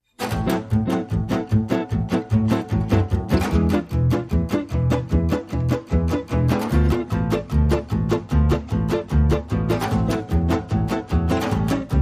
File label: No Manouche Guitar Lead